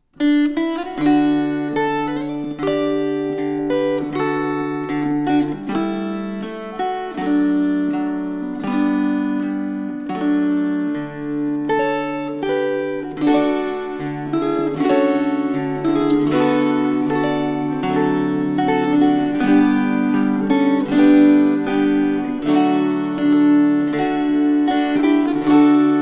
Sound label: String Choir